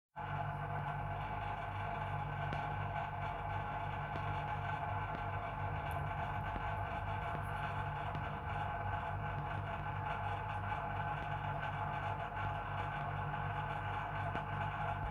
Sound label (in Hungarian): Hűtő hangja